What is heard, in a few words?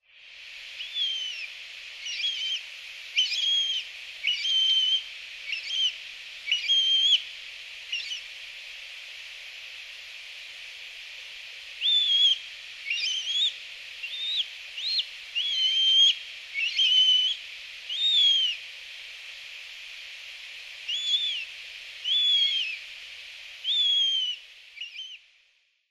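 Eurasian Jay; kakesu; hyogo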